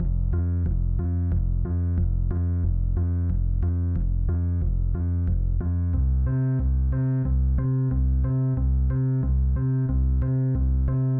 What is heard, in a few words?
Bass